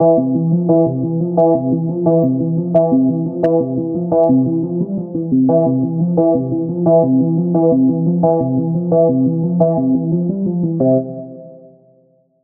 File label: MUSICLP; neuro; Stanza